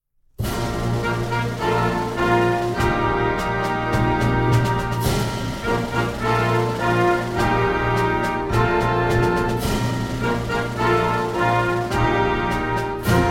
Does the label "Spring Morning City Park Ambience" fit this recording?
No